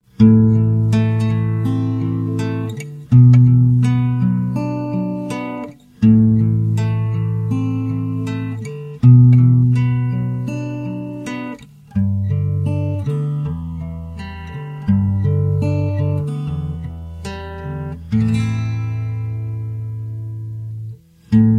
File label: Guitar Song